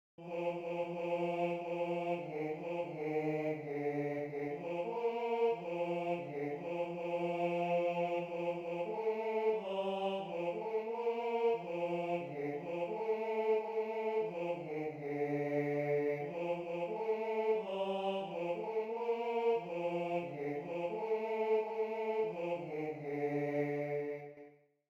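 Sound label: male choir, Bass I; Kemmts leie einer in die Stubn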